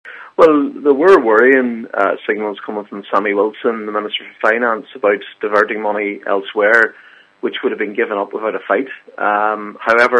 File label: pad